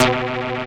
rave; instrumente

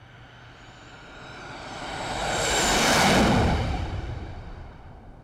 Airport

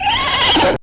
Horse

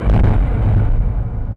Explosion

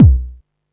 kick

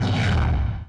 Long Shot

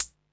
hat

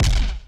Deep Shot

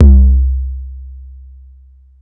disto bd; percussion

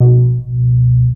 Synth Bass short

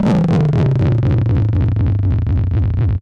Descending Rock

